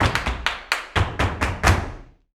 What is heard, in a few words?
BULERIAS TAP, Flamenco